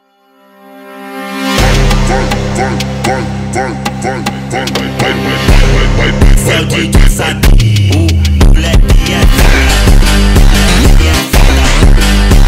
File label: brazilian funk phonk